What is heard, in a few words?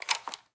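Inspect Movement; Valorant; shorty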